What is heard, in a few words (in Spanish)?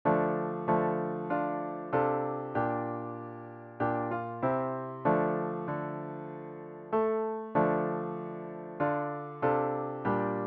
piano; Cantata para SATB, Solistas y Narración